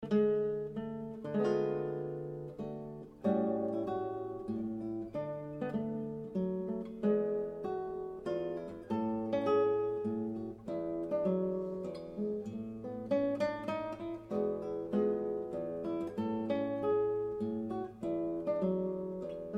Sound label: Zwei Polonaisen Und Mazurka, guitar; Mertz